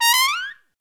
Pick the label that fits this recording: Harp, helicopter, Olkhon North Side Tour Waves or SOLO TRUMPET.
SOLO TRUMPET